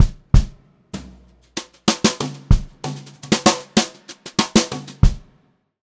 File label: FILL, Brushed Drum Loops